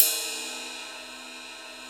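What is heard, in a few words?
20-inch ride A; InVision Interactive; Lightware VOL-1; Instruments & Percussions; CYMBALS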